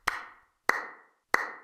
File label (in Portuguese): Aplauso irônico (três palmas)